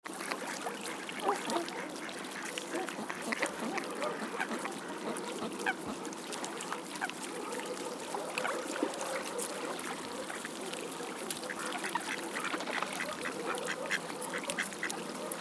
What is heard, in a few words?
Park ambience ducks in a pond moving around in water